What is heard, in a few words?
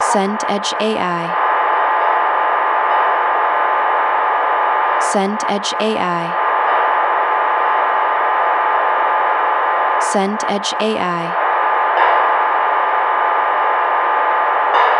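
ambience restaurant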